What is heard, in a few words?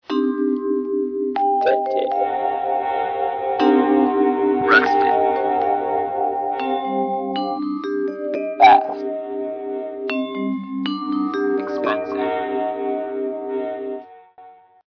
car